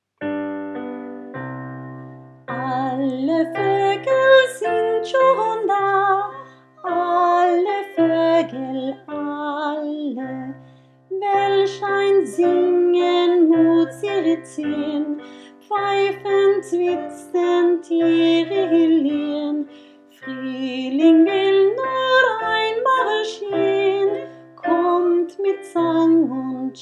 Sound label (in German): Alle Vogel sind schon da baritone